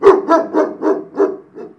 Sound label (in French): chien